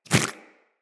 Inf Small Walk Default, Footsteps; Infested

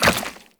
Run Goo, Footsteps; Warframe; Characters; Tenno

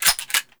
Heavy Weapon Clip Out, reload

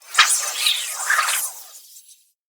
Gas City Wire Whip; Warframe; Corpus Gas City; Ambience

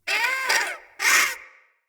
Day Close Critters

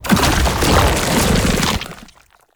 tank infested swipe charge; Enemies; Abilities; Lasrian Tank; Warframe

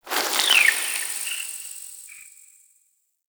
Interior Cave Critter; Ambience; Warframe; Duviri

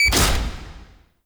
Railjack Weapon Power Ready One; Warframe; RailJack Weapons; Modular Weapons

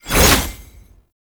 Gunbrella Aim Transition; Weapons; Warframe; Tenno; Koumei

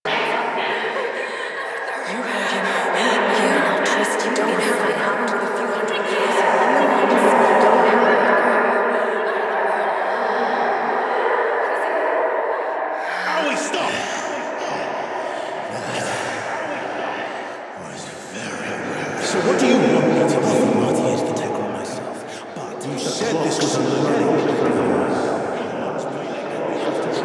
Crawl Hex Vocals; gameplay; Warframe; NineteenNinetyNine; Stage11FuturePast

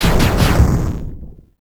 Elevator Door Explosion; Warframe; Caliber Chicks; minigame

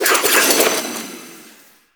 Kunai Reload; Warframe; Weapons; Choir

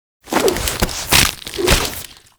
Gauntlets Stealth Hit; Finishers

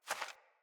Beaver Dig; Foley

